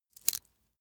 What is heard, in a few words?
metal pouch impact thin